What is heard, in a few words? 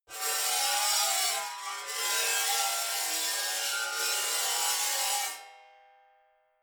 metal scrape rich straight